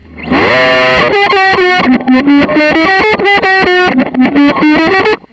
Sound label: SOLO GTR, GUITARS KIT; Big Beat Samples & More; AKAI